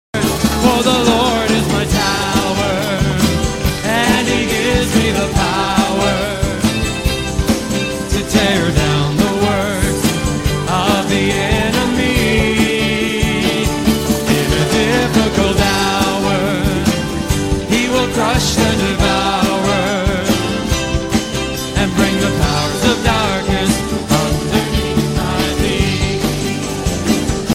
nokia ringtone

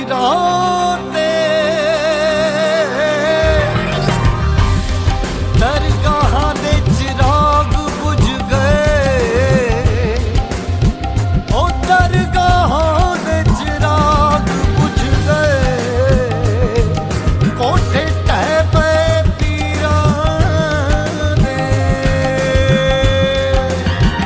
hindi ringtone

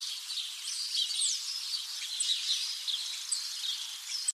birdbath ringtone, sms ringtones

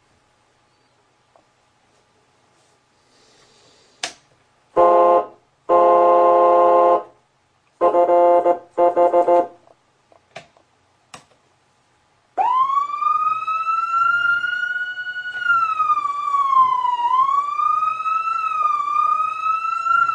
Whelen siren dual wail yelp pier ringtone

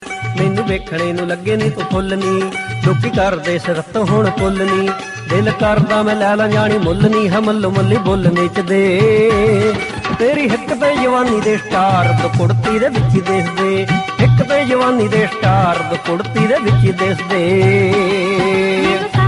punjabi ringtone

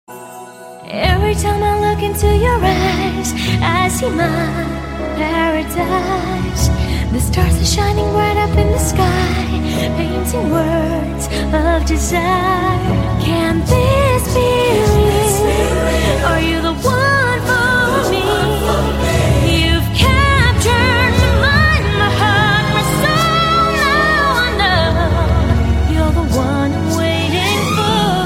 surili english ringtone

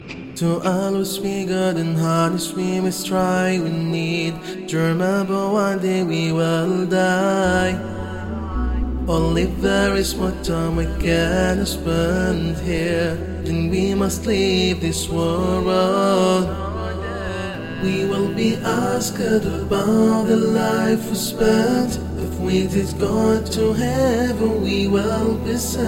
love song tone ringtone

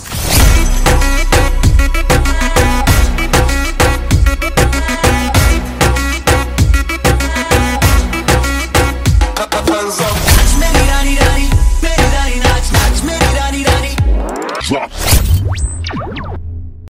ringtone bollywood ringtones

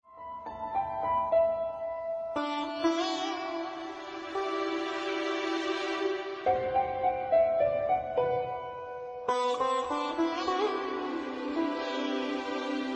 drama theme ringtone; dastaan; bollywood ringtones

